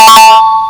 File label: correct answer bass boosted